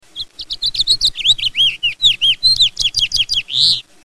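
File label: House Finch; Song: A bright lengthy song, loose and disjointed; frequently ends in a harsh, nasal wheer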